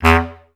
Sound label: STAC E1, Orchestra; Best Service; Peter Siedlaczek's Advanced Orchestra